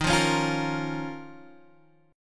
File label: Eb 7th Suspended 2nd Eb, Guitar Open G; strummed; chord